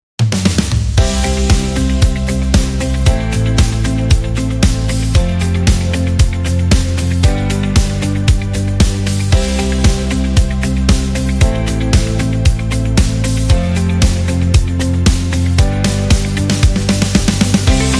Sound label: Corporate Event